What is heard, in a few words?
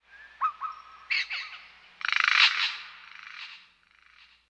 bird echo, environment; t2-mapper